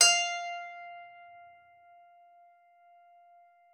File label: KCYM F5, KLAVICYMB; Xsample VOL-8